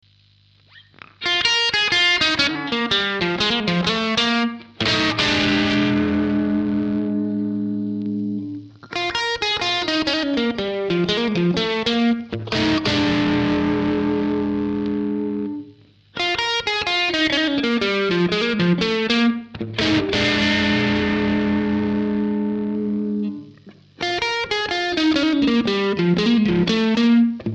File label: crunch